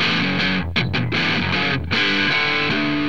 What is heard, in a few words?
guitar, heavy distortion guitar